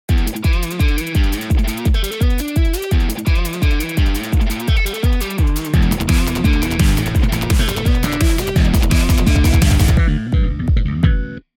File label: TRANCE; 後藤マサヒロ